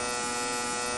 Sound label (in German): wascher loop; volvo 9900; Vehicles; AI volvo 9900; Freyfurt Beta